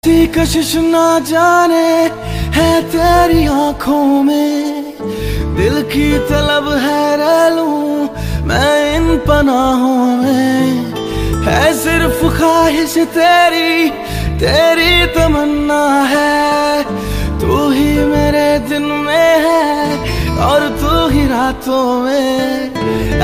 romantic bollywood

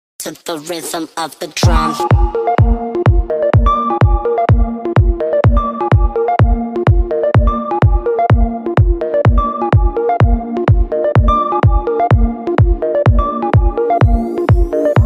electro, ringtone

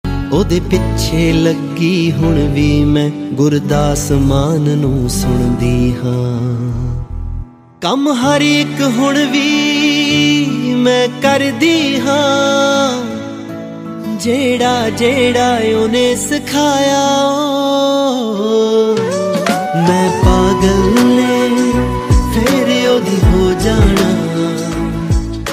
punjabi

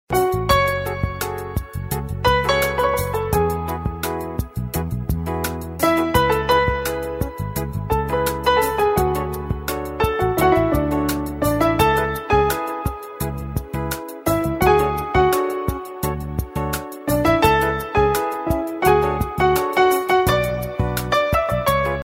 instrumental ringtone

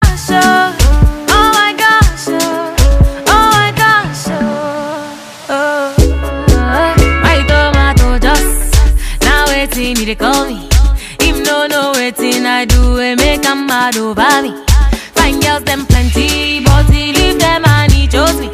african, ringtone

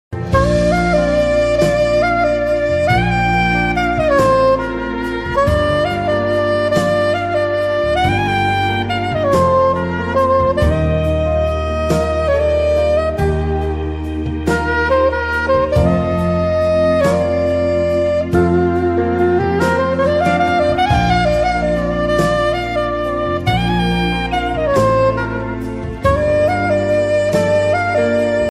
trumpet

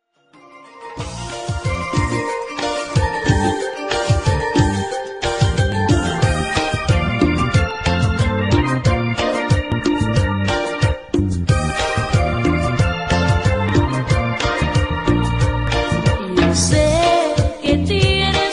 electro

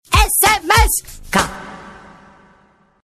sms notification

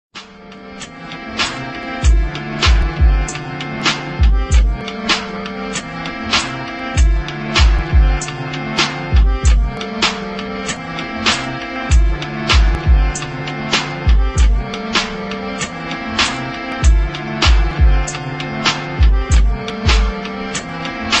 accordian instrumental

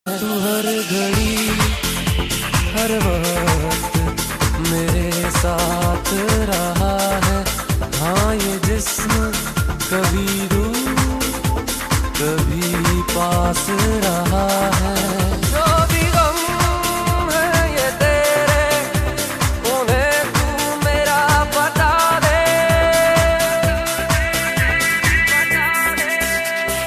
emotional

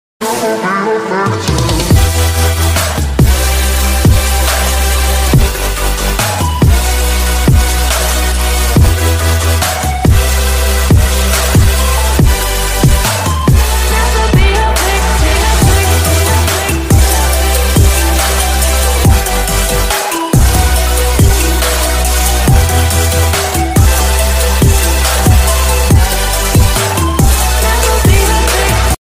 instrumental; pubg

